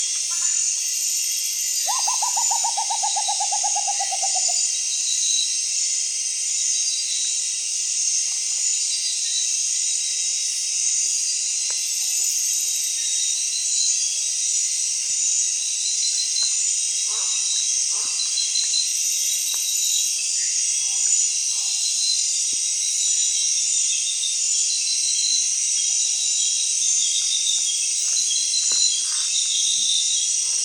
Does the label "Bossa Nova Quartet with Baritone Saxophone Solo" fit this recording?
No